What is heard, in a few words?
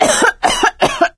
cough, survivor voice